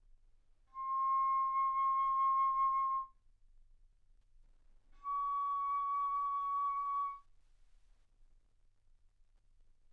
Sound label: Bass Flute ff C6 Db6